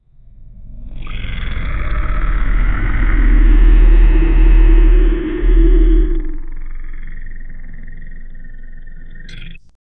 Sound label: mutant cultist growling